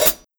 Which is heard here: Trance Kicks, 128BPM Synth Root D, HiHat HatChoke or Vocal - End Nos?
HiHat HatChoke